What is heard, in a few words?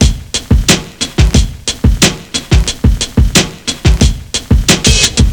Soft Kit A; Ударные и барабаны; Electric Jazz; 130 BPM; Drums; loop; Soft Kit A (Электро Джаз)